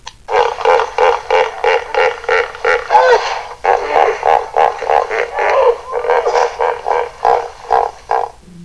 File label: hippo